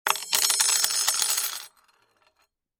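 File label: knitting sounds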